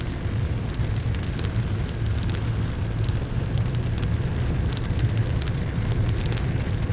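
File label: fire cruiser loop; rep cruiser; Star Wars Obi-Wan; XBOX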